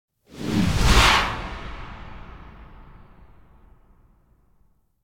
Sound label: Whoosh Harsh Coming Air; Hollywood Edge; sound designer's toolkit v2